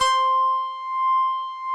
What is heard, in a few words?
OBDX GTR; Synclavier Sampler Library; KEYS AND GUITARS